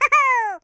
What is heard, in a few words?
yoshi hoohoo; sm64coopdx; char-select-extra-chars